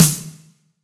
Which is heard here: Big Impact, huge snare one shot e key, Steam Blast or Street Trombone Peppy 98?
huge snare one shot e key